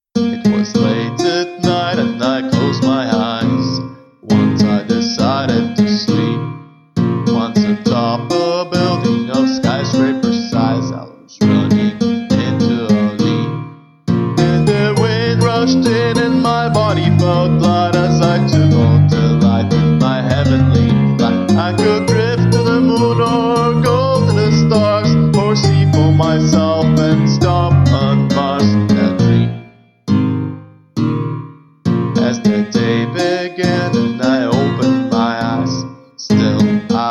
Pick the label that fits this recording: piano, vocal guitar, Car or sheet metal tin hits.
vocal guitar